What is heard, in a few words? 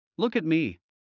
Heart mid; Classroom humanbody